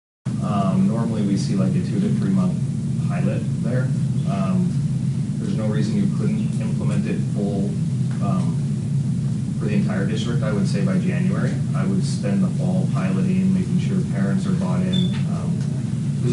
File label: bus